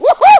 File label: WHOO